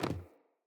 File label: Sandals Land Wood, footsteps; Player